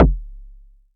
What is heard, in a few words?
kicks; FutureRetro FR777